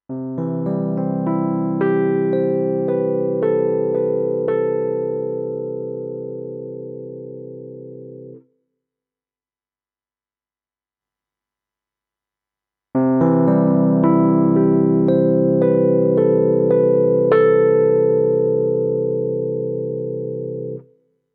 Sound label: E Piano